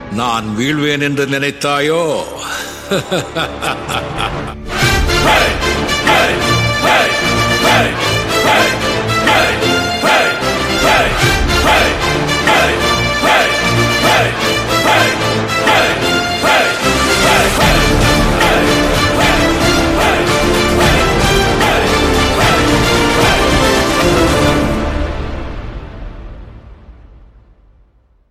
Mass Ringtone

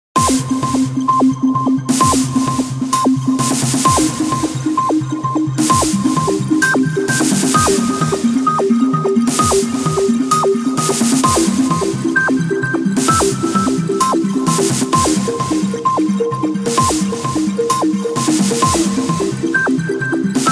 Modern Sound

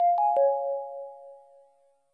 Offline Sms

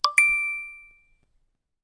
Bike r iphone

